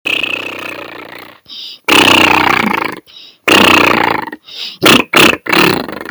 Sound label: massive dump fart